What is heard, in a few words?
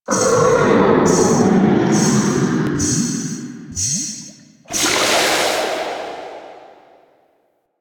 Fishing Five Second Timer; Duviri Maw Fishing